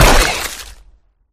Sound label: pop; flood